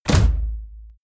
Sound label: bodypercussion claps; whooshes rises; hits impacts